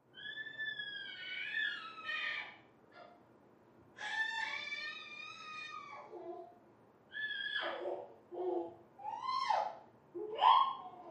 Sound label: Baby; Happy And Excited Squeals And Laughs, From Next Room